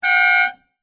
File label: error Msg; Packet Tracer 5.1